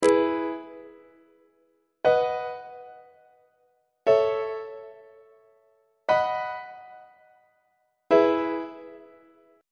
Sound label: f flat major inverted iac; on treble clef